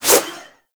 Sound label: Proj Throw; Hoplite Army Replicant; Warframe; Warframes